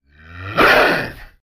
Leopard Snarl Attack; big cats

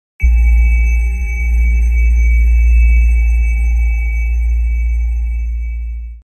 Bell High Frequency Bell Feedback with LFE

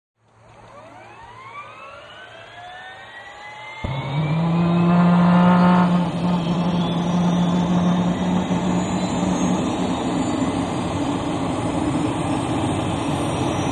Helicopter Startup, jet ranger

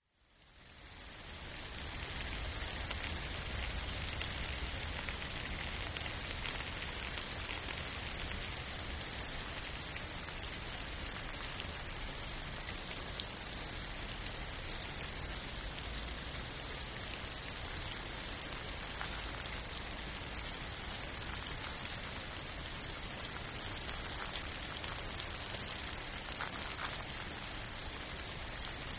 Rain Fall On Grass

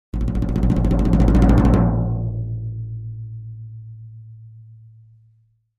Drums Toms C Low Roll

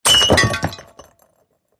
Jug Smash

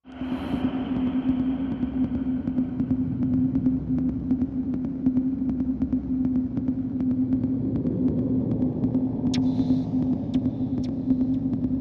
Low Satellite Medium Space Pulses Solar Wind; probes

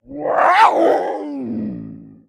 Puma Cougar Growl Sho, big cats cougars

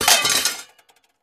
Junk Thrown Box, junk crashes; Junk Thrown Into Box

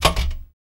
Door Push Bar Door Cl

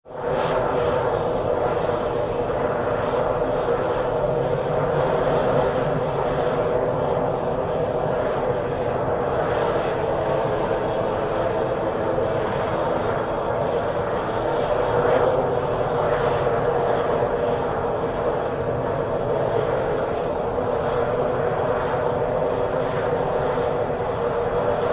Jet Engine Idle L101, jets

